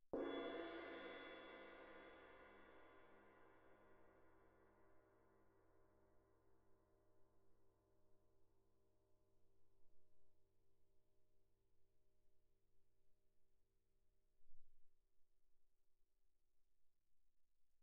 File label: crash mallet mf, suspended cymbals; Percussion